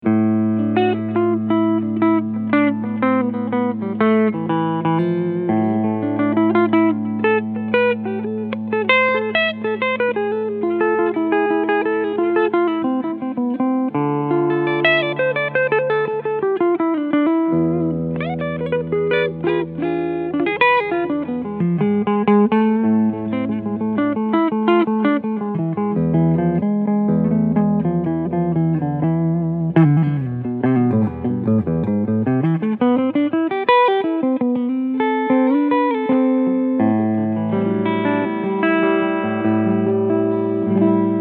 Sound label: Cleansound Front Pickup; Gretsch G2420T